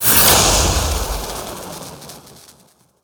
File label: Thunder Lizard Death Underlay